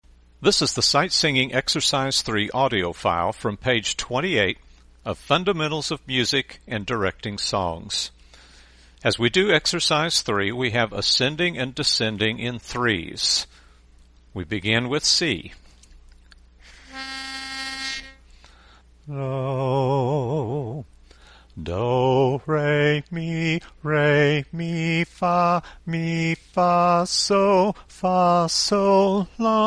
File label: Sight Singing